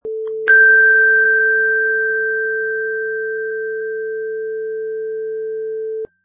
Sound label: Long Beep